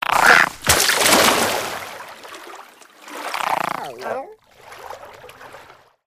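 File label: Event Garden Frog Jumps In Pond; the unfinished swan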